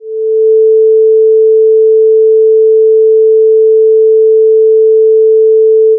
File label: sine 440Hz